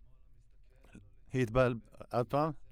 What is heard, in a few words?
Voc OD